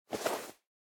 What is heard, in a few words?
Clothes; Smite; Susano